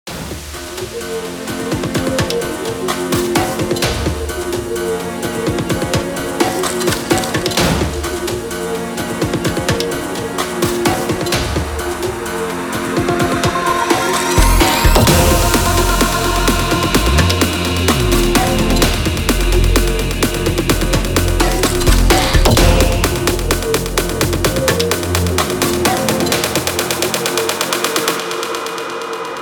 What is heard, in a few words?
Big EDM Future House